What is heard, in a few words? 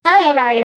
do a one bounce of